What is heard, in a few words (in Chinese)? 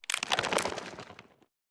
嘎枝待机声